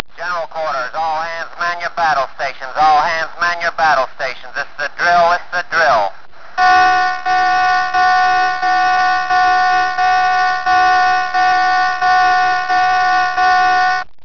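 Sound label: general quarters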